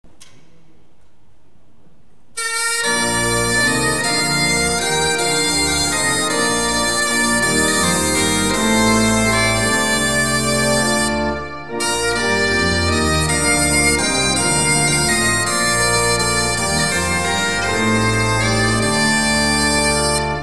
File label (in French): Orgues et Bombarde